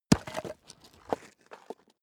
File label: stone plate drop